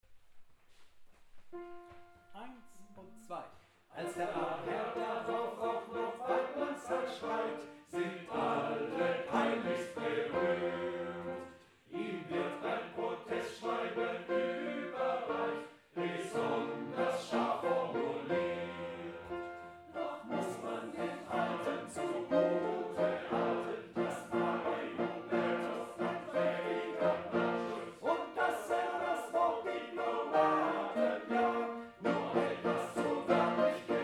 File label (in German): tutti; diplomatenjagd